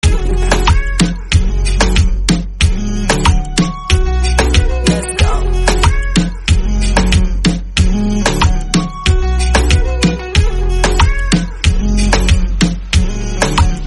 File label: Instrumental